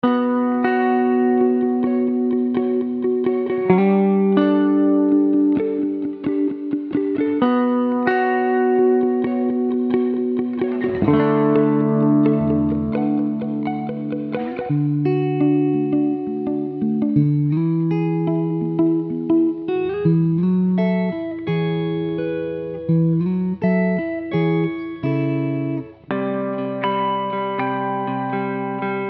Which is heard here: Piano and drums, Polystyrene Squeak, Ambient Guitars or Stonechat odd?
Ambient Guitars